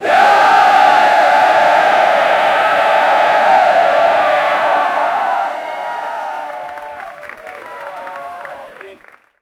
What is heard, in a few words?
a crowd of 10000 people, chanting